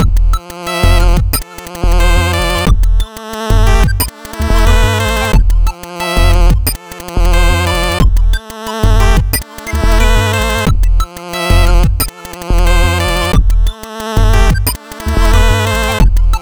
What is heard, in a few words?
fluttering grass; lobit pack